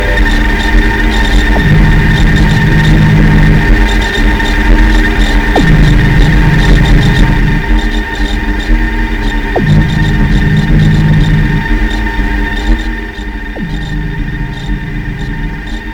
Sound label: evil organ grinder; technica obscura